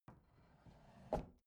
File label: bathroom drawer open soft